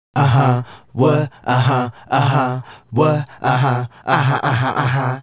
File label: Male Group, Hip-Hop and RnB Vocals